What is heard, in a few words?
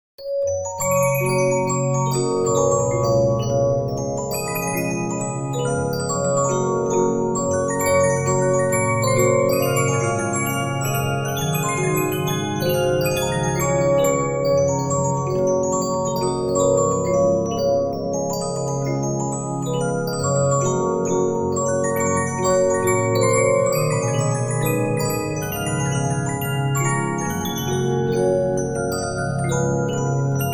Regina, musicbox, 15inch; disc musicbox